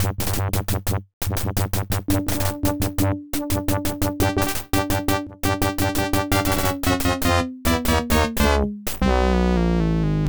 Time Warp Fail; Space Quest IV Roger Wilco and the Time Rippers; IBM PC AT

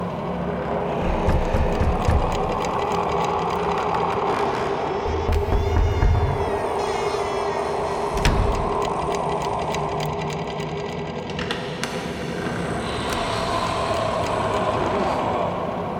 Bedroom; Toy Odyssey The Lost and Found

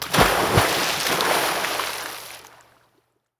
water splash

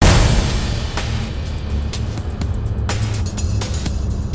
Medium Music; Alien Breed Trilogy; PS3; Xbox 360

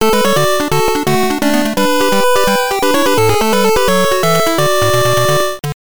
Game Set; extra bases; gb

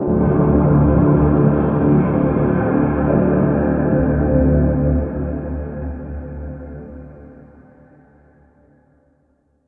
Combat Mixed

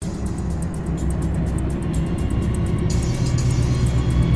Low Music; Alien Breed Trilogy; PS3; Xbox 360

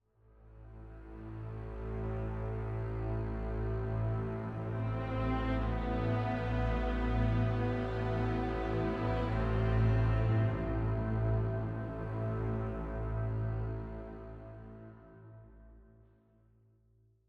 Combat Lite Mood

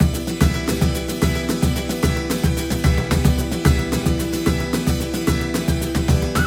mus Tavern Brawl; Siralim Ultimate

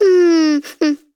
Classic Miss Sound; Jolly Battle; Android

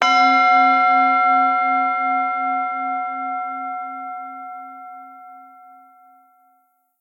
Buddhist Bell

